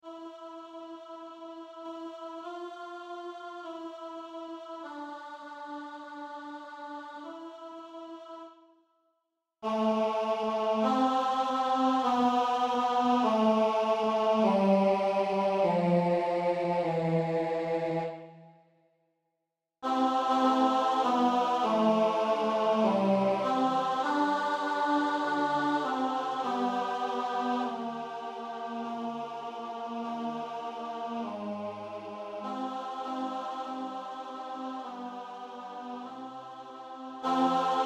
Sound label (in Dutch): tenor, kyrie